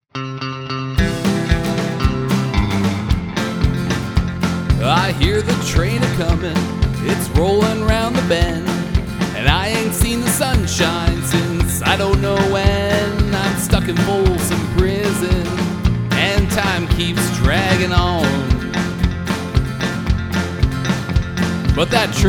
2i2 Studio Full CM25 Air Setting ENGAGED; focusrite